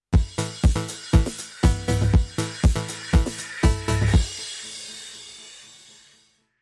Sting

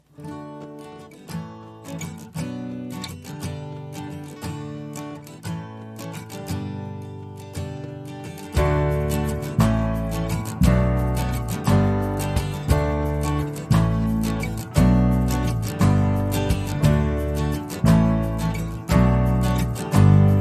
Drums No Melodica

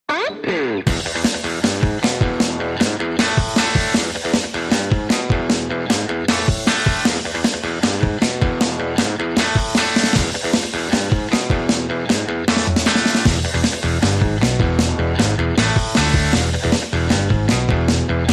No Organ No Synth SFX

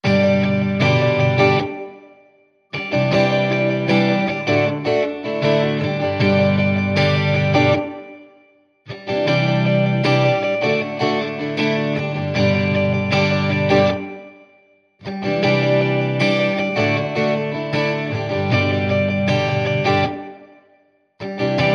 Simple Days Of Joy, No Drums

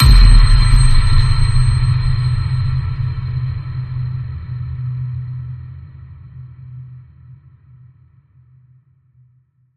High Ping, C

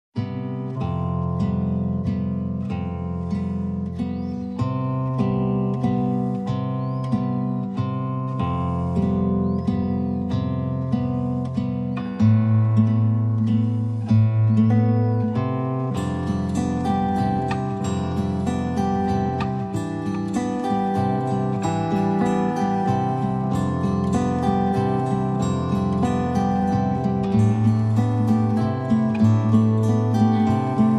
Guitars Only

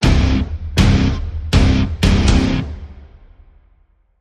Stab Guitar Phrase (C)

